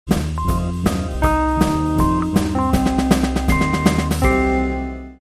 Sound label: Night March